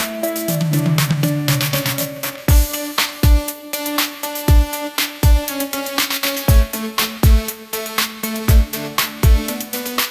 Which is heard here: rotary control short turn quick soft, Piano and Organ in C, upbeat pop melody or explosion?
upbeat pop melody